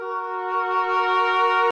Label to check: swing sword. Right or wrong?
Wrong